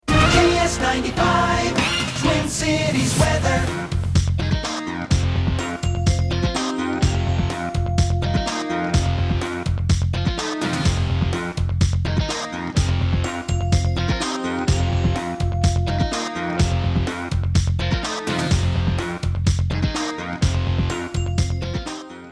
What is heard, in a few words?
Jingle Weather; KSTP FM